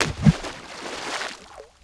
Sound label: water stoun splash